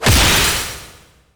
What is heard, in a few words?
Enemy Gear Common Delete